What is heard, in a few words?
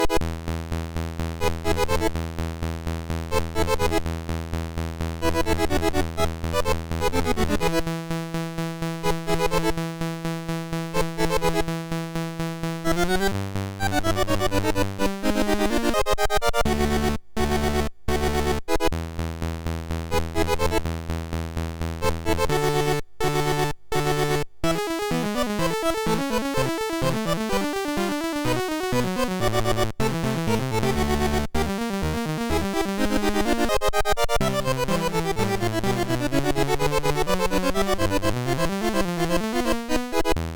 Opera, sid MOS6581R3